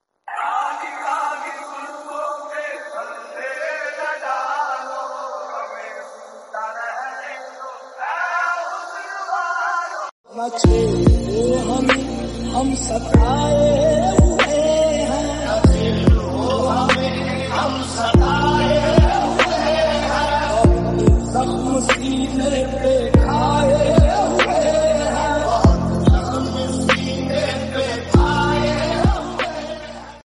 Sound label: lofi